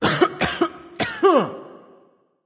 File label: clearthroat; erlangshen